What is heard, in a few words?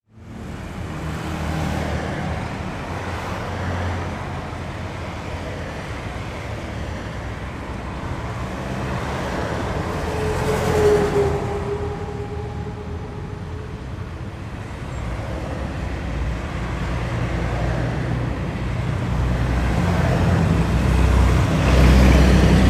Motorway Heavy Traffic